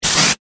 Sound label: Aerosol Can, Aerosol Can Spray; Cleaning; Household